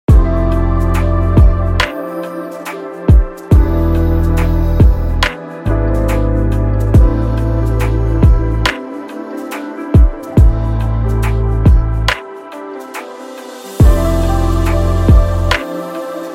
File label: Lofi Beats